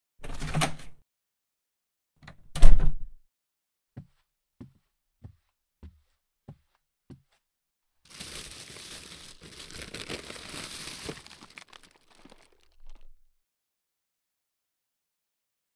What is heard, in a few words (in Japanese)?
まずはチョコを刻んで……（料理音:包丁）ASMR